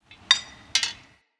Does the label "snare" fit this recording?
No